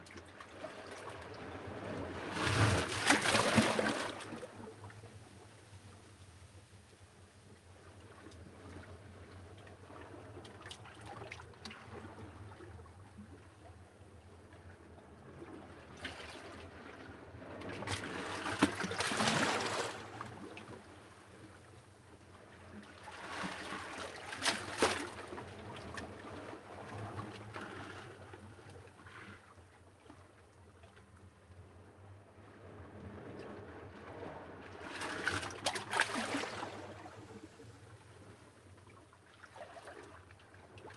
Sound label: sea between rocks cavity cracks calm; Sonniss GDC